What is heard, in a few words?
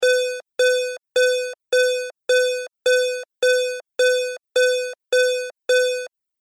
Warning Alarm Beeps; Alarm Sound Effects